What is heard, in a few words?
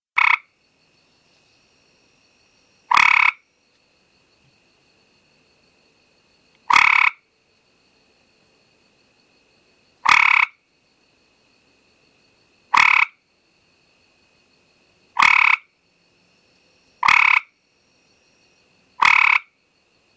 frog, Call a slow trill; Hyla versicolor